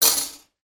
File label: medical dentist mouth mirror or other metal tool place down